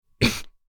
Adult female cough mouth closed

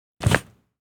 Plastic bag put item; gfxsounds

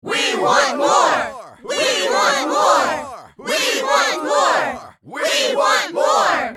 Group of people chanting we want more; crowd

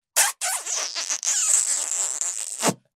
Kissing with juicy shrieking smooch

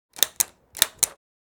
Paper hole puncher punching paper

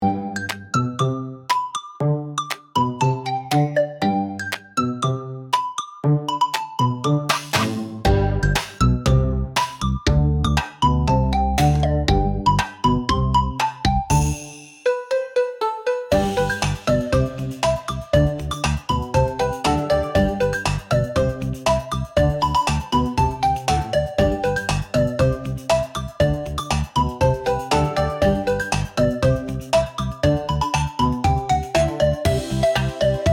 Playful whimsical wobbly dance

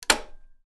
Electric fan plastic button switch off; appliances

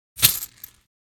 Casino win small bag of casino coins

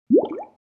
Liquid bubble popping at surface

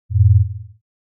Hologram portal opening closing

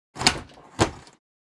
Airplane interior bathroom door open; vehicle

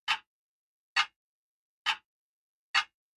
Grandfather clock ticking

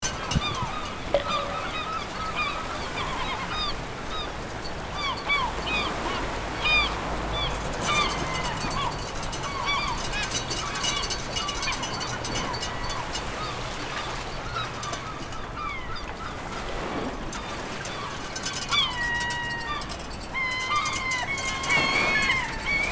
Waves crashing on pier ambience loop; ocean

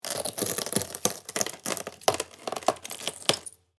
Glass crunchy pressure breaking

